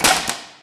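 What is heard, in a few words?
physics clipboard impact medium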